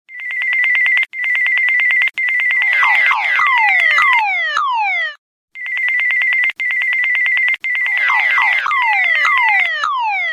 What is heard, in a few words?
Alarm